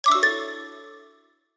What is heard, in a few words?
Zeta; HTC Mobile Ringtone